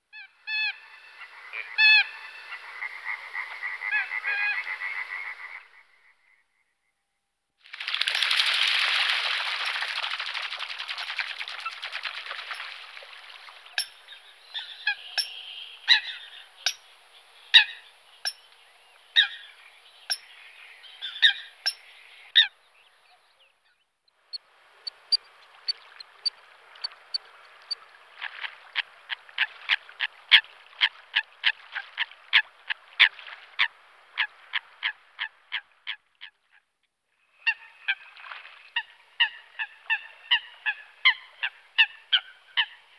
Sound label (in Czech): Lyska cerna Fulica atra